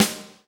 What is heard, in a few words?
XV Snare, Roland XV-5080